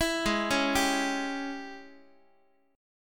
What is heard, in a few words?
G sharp Major 7th Suspended 4th Sharp 5th, Guitar; x,x,7,6,5,6; JGuitar